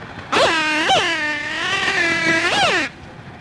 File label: Pteronura brasiliensis